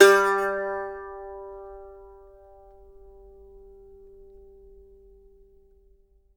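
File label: G3, Mandolin; Sampleheads; Whole Lotta Country 1 & 2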